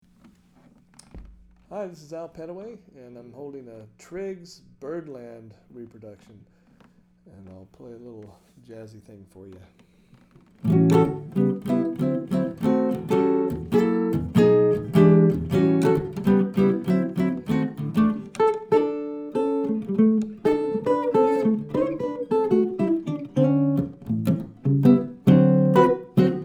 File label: triggs byrdland; archtop guitars